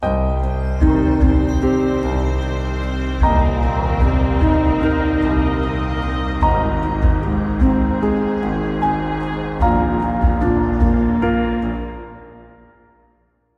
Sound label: moods beautiful peaceful